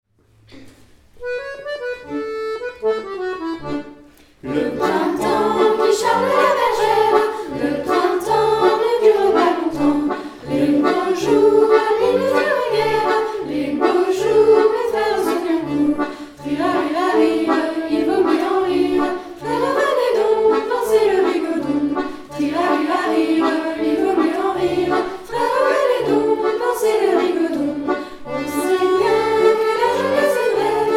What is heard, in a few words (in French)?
rigaudon